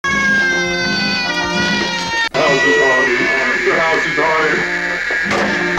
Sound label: screaming; scary sounds